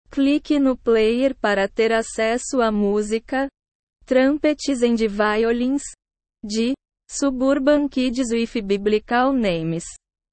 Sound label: trumpets and violins